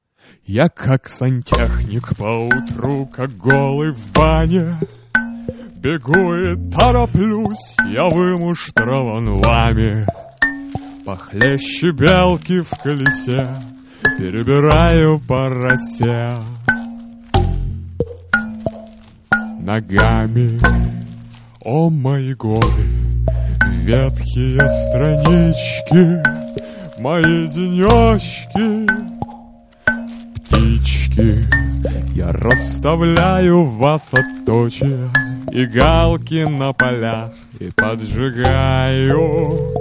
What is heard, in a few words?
tango